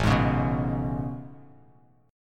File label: A Major 9th A, Bass Standard; AM9; strummed; JGuitar